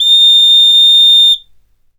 WHSTLWD HI, Synclavier Percussion World & Orchestral; ILIO; Synclavier Percussion 2